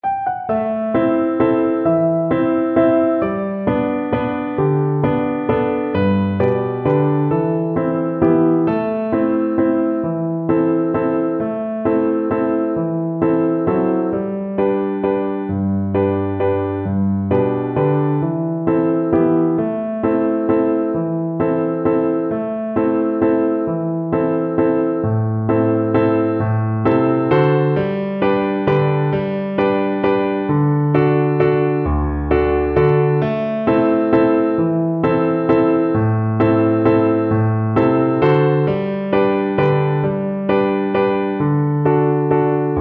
spancil hill, Flute

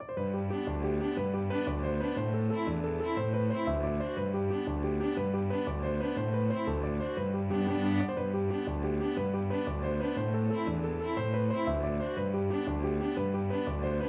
irish jig medley, piano quartet

